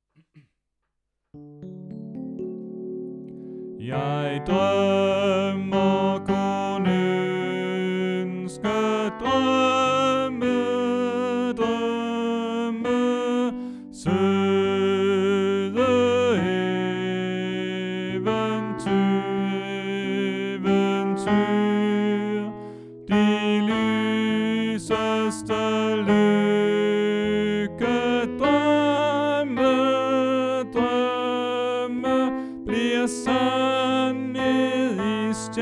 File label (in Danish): Bas